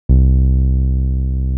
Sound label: TB 303